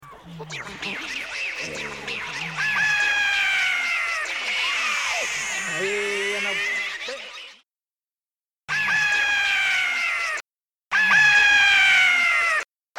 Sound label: Claustrophobic Sting Scream